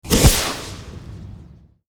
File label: explosive potion poof; hollywoodedge